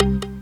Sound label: magic; Geschicklichkeit; Enigma